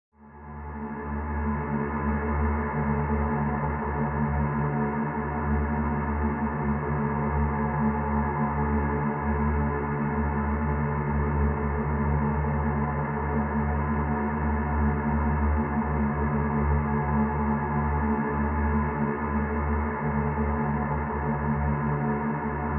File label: Drone Dark Suspense; iMovie Sound Effects